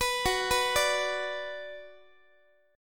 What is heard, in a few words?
F sharp Suspended 4th Sharp 5th, Guitar; arpeggiated